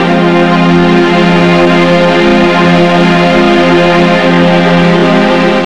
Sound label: F, Strings, Drum & Bass; Film Noir 170; Total Drum&Bass